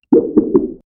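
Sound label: water slime death, creature; jrpg game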